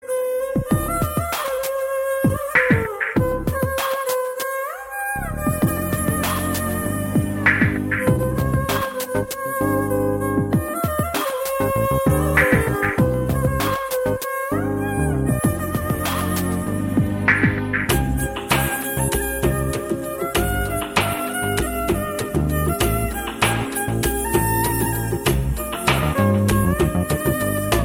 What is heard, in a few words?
Malayalam Ringtone